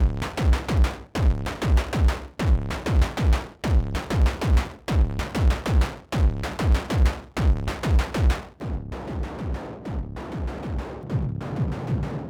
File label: KMA Logan Moog DFAM